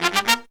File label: Pop & Funk Brass, 120